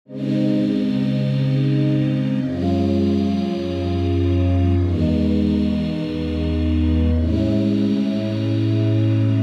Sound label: Pad; Airey; FOCUS Preset Pack